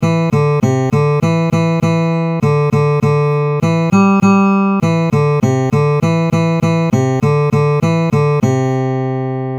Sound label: mary plucked soft